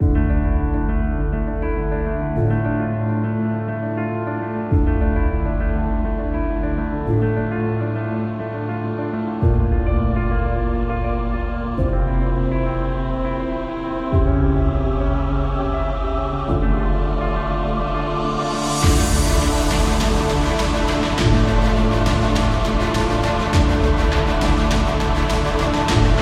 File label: Epic Drama; Ukraine